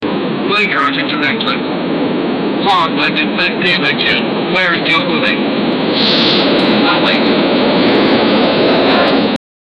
inside a plane coming in

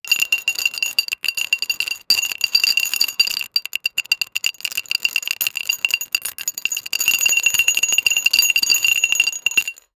glass poker chips fal

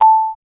notification sound

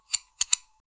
gear change, mechanical

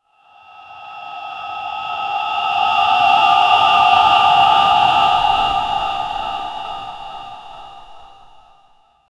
ultra realistic shamanic, occult

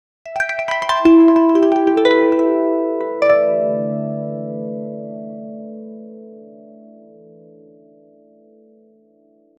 soft harp arpeggio

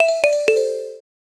a quick energetic jingle, adventure

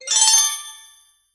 whimsical sparkle sound, fairy tale

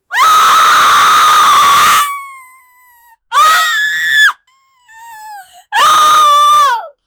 crying

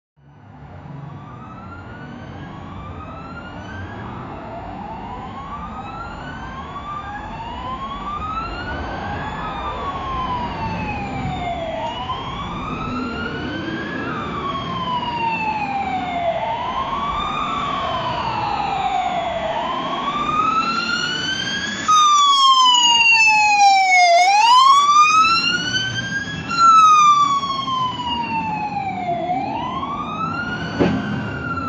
miami, busy street

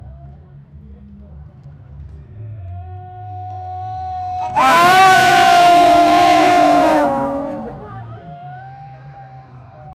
go karts racing

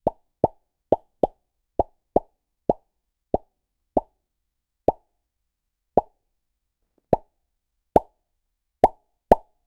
single pop sfx, small

